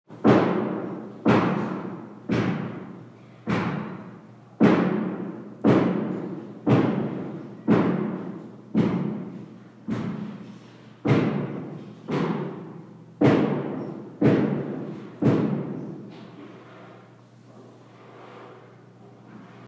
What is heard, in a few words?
Demolition Noise